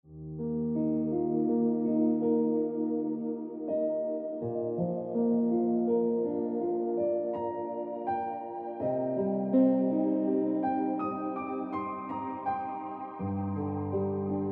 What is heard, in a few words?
Ringtone Piano Music